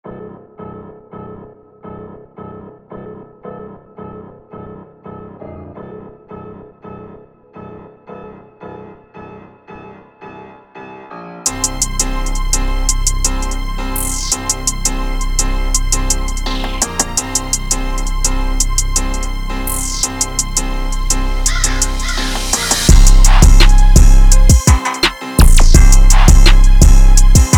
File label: 168bpm; C; Trap